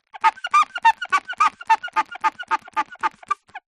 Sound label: leisure inflated rubber pool toy rub ground squeak